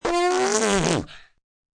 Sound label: Mouth Fart, Mouth effects, 1 child, raspberry, high-pitched, human voice, kid; Sound Effects:Human; VoiceBox